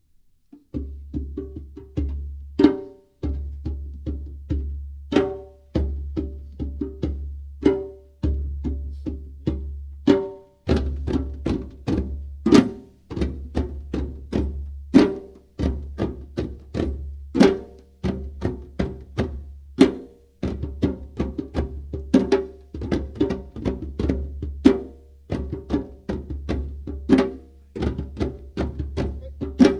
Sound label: djembe; Musique Rois 2010